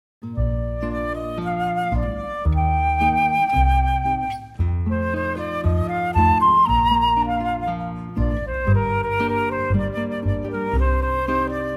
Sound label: guitar flute bass trio